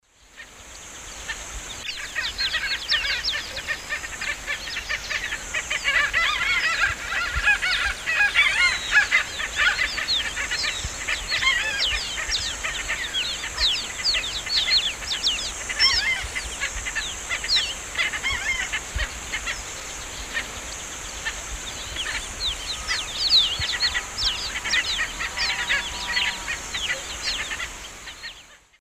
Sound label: Phaetusa simplex, flight calls; S. America; Eastern Lowlands